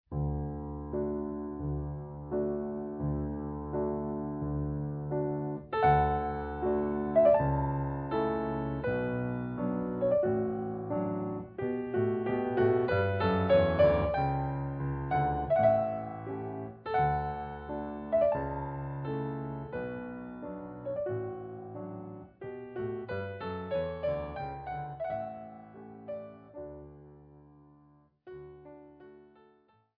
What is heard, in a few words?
Warm Up Gavotte; Rameau